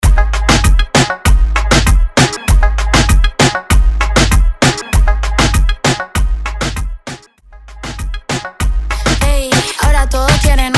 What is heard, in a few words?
intro outro 97 BPM